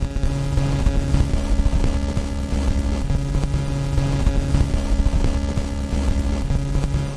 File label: Static interference